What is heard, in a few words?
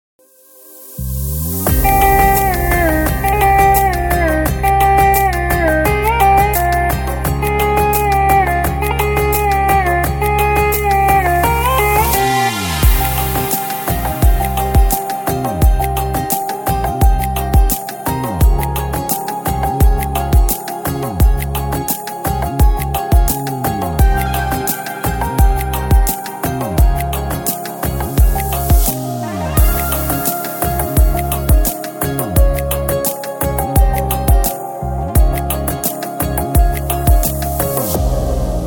ringtone